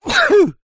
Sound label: human male sneeze